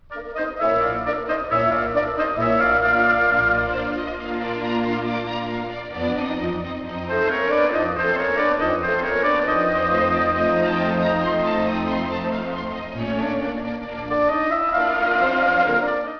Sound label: mozart piano